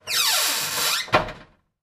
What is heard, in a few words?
Oven Close Door; appliances